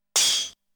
Sword Hit; Namco Game Sound Express Vol.1; Valkyrie no Densetsu

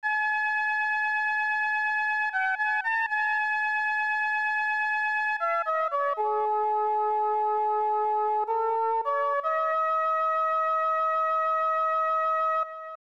Crescent Moon Flute; benkei gaiden; snes

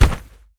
enemy death land hip

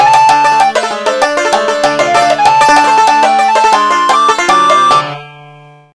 complete

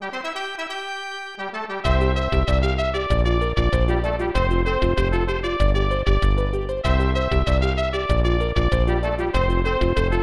Victory Fanfare; Down the World: Mervil's Ambition

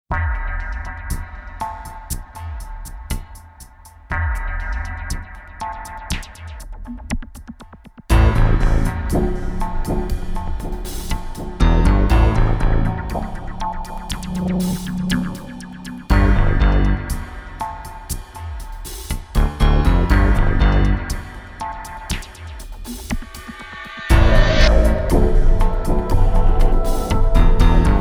gothic horror; Ikkitousen Original Soundtrack

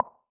tile

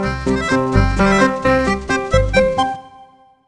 Jingle Start Fanfare; tamagotchi connection corner shop ds

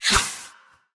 Throw; portal quest android; Rebel Skill2

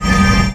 Heal

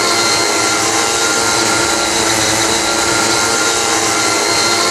Amb Generic; Dirt 4

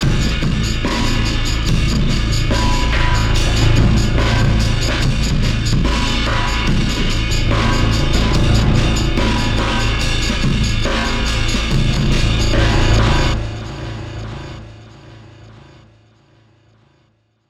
almostfinale drums head 72bpm 16beats

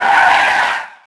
Skids Long

